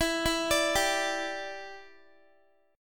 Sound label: E Minor 7th, down, Guitar; Em7; arpeggiated